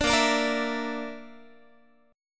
Bb Major Flat 5th, Guitar; strummed; 17,18,17,20,20,x; 4A,4E,5A,5D,5G#,6C#; BbMb5

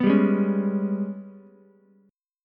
Ab Minor Major 7th Double Flat 5th Ab, Ukulele; strummed; JGuitar

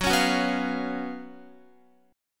Gb Major 7th Suspended 4th Gb Guitar

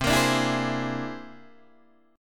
C Augmented 9th, Guitar, Open D

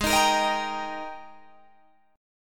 G sharp Major 7th Suspended 2nd, Guitar, Drop D; 18,18,20,20,20,18

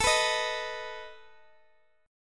A Suspended 2nd Flat 5th, Guitar, Half Step Down

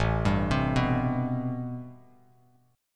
A-Minor 9th A Bass Standard; arpeggiated; JGuitar

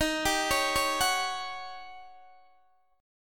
Eb 7th Sharp 9th Eb, Guitar Open D; down

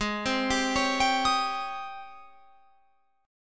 G sharp Major 11th G sharp, Guitar Open F

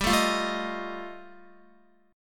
G 7th Suspended 2nd Sharp 5th G, Guitar; x,10,9,10,9,11; down; 4F,4A,5C,5G,5C,6E

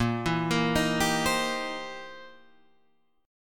C 7th Sharp 9th, A sharp

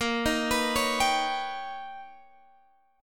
B Augmented Major 9th Bb, chord

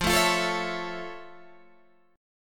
F Augmented Major 7th F Guitar Open G

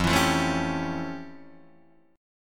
F Augmented Major 9th, Guitar, Open D

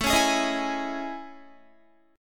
A Major Sharp 11th, Guitar; AM#11 strummed

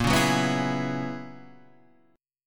Gb 11th Bb

